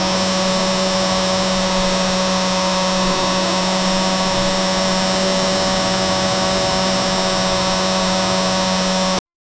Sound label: drone propeller hum appro